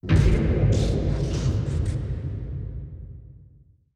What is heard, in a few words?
space cloud; Stellaris